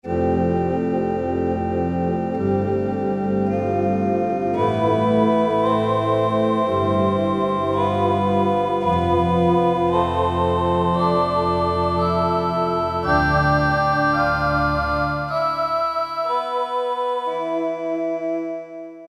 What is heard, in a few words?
Chorale, Roland D-110; Factory Patches